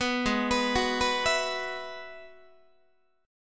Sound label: G sharp Minor 7th Sharp 5th G sharp down Guitar; G#m7#5 arpeggiated; 14,16,14,16,15,15; JGuitar